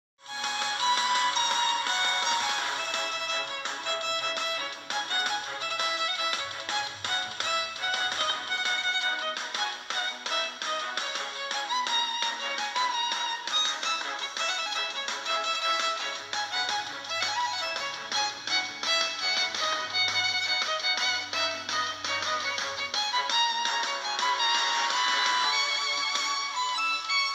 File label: Violin